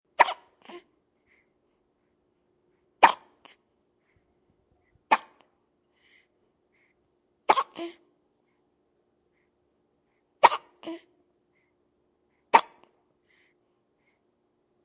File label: Baby Hiccuping